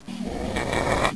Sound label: baby alert